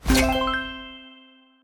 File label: button click